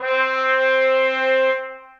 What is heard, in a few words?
Trumpet Section Mute Legato; Ableton; Orchestral Brass